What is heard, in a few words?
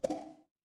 ui pop